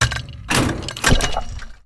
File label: Door Bolt Op